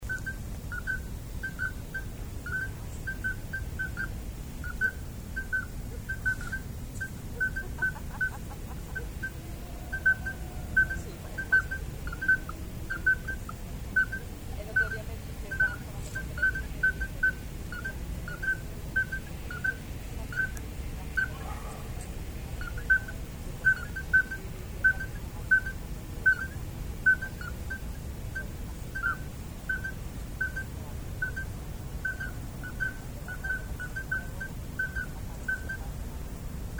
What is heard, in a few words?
beep from below